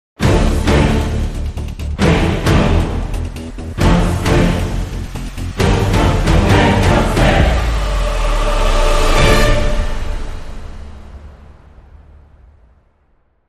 Dramatic Sting

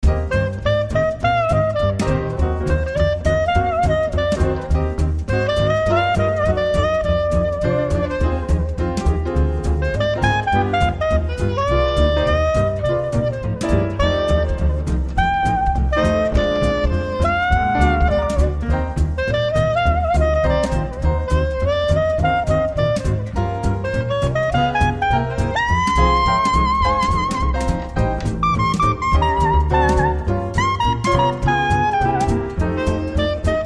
Dixie, Theme Only

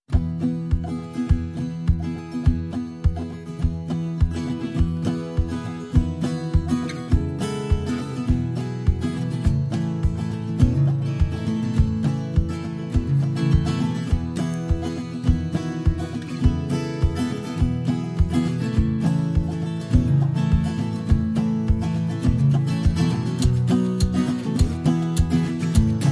Drums and Guitars Only

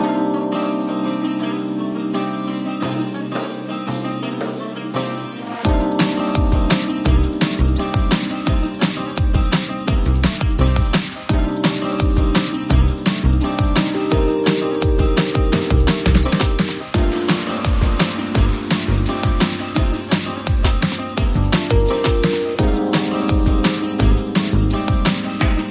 Rushing Bouzouki

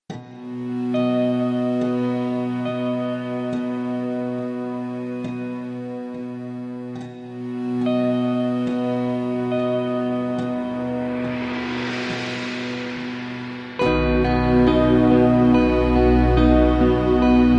No Percussion Loop

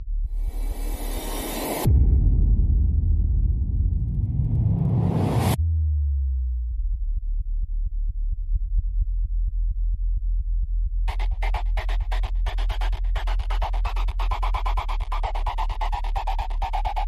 Perc and FX Only

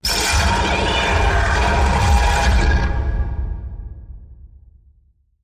Big Echoey Sputter, Taylor Grand

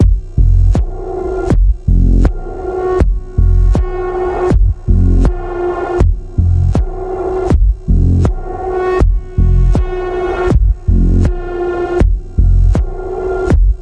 Throbbing Pulse F, Key of F

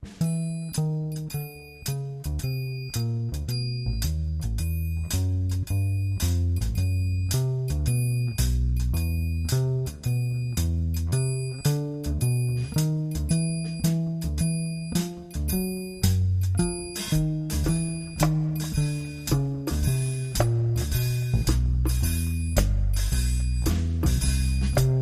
Drums and Percussion Underscore

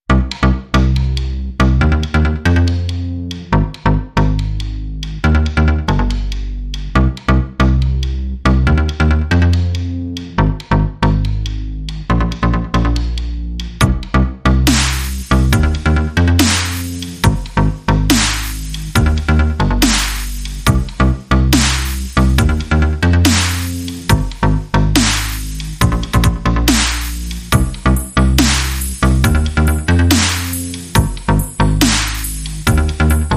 A Chillstep Drum & Bass Only

